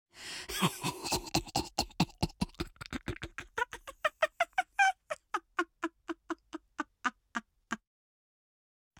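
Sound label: AMB China 3D Ambience; gamerip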